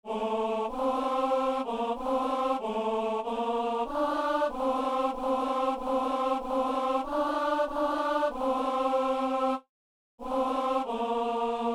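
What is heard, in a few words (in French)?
ténor; C'est toi, Seigneur, le pain rompu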